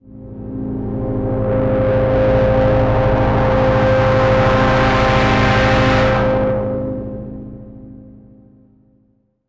Another Riser

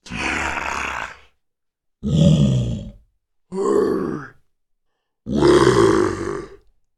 Zombie Growls

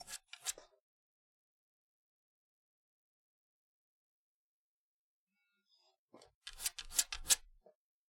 Dog Scratching Door

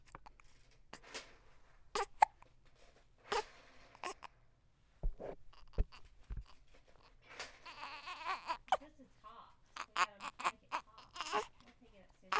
Baby With Hiccups

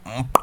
Hmm Pop

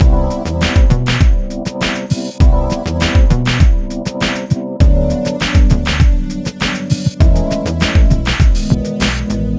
Bpm 100

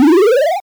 Retro Game Heal Sound

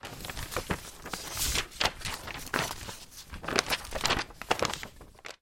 Paper Mix

